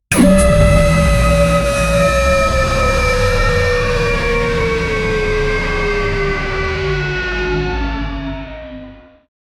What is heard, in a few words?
explosive telekinetic